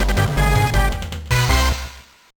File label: Jingle; Boboboubo Boubobo 9 Kyoku Senshi Gag Yuugou; GBA